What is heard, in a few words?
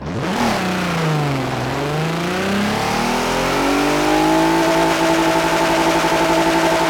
first, vehicles explorer; gmod; sgmcars